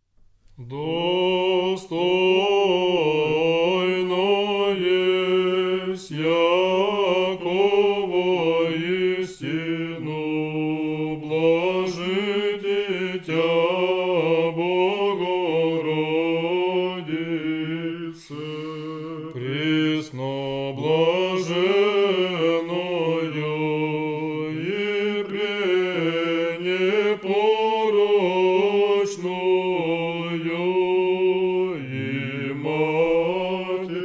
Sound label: it is truly meet tone 3